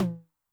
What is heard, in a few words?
HI TOM, Noise, 909